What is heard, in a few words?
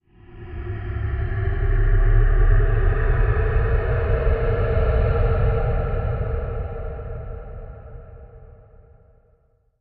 Growl, ghosts & demons